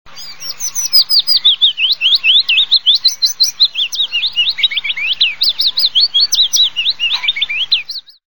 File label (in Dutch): fitis